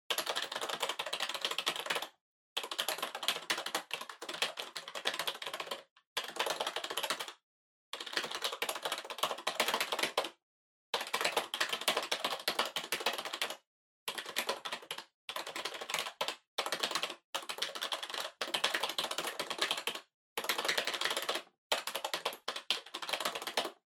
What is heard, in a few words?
PC Keyboard Far Hard